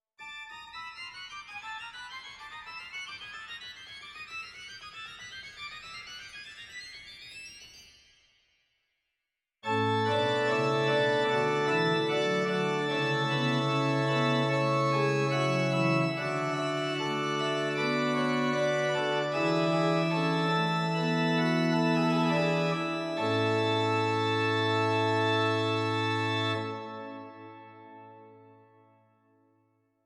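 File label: Bovenpositief Scherp, organ; zwolle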